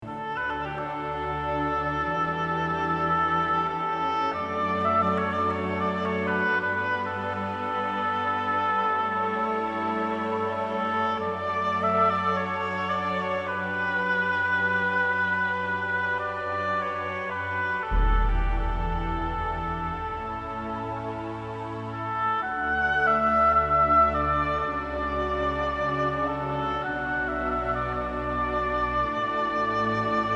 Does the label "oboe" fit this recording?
Yes